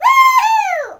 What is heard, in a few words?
Woohoo